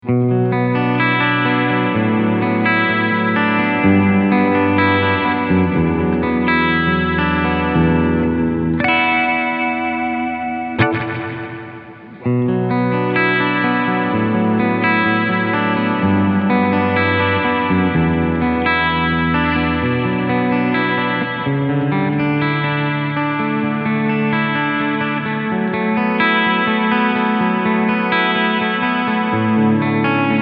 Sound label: Clean Hall